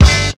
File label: HIT, Hits; Houseworx